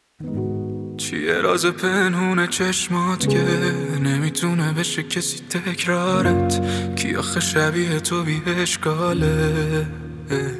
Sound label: piano version